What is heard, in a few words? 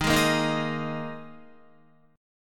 Eb Suspended 4th, Guitar; Ebsus4 strummed; 11,11,8,8,8,10; JGuitar